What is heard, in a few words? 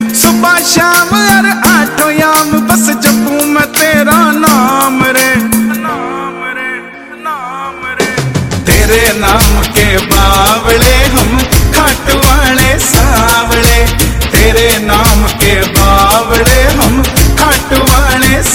ringtone; khatu shyam